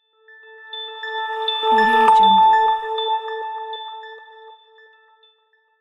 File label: magic bell transition